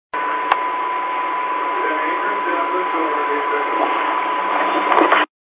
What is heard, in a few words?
background sounds